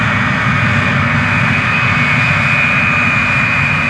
running, BAe-125

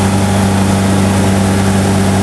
el car; FGUK; FGR2Phantom

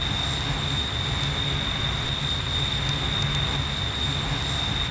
turbojet fan sirent; FGUK; FGR2Phantom

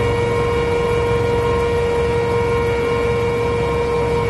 inside loop left, Lynx internal audio loops

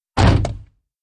R44 Door close; ec130

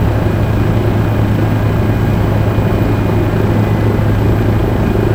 main rotor idle power inside L, ec145

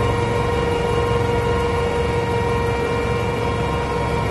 inside loop right, Lynx internal audio loops

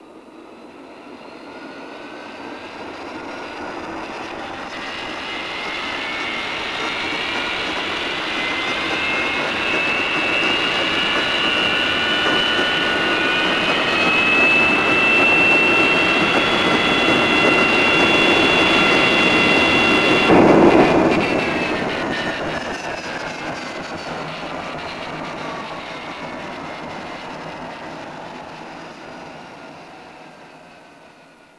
start, BAe-125; FGUK

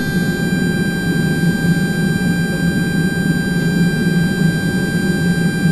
avionics 400Hz; ec130

